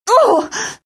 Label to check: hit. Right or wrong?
Right